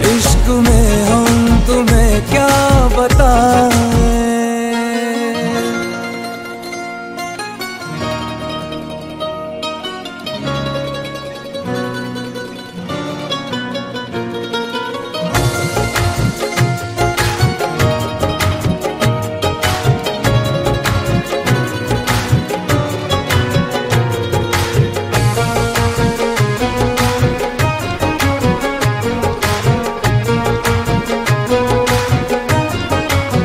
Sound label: Instrumental Tone